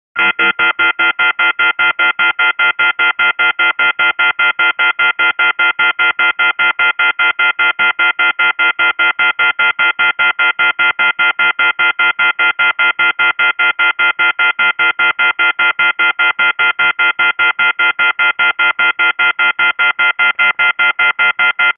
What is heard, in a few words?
System Alarm